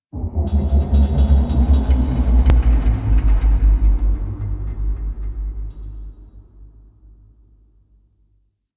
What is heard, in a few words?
Guild Ship Thrusters; Ambience; Warframe